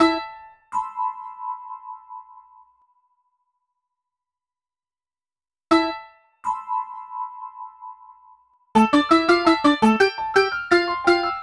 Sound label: Kokan Bounce, Hip-Hop; Sound Effect Library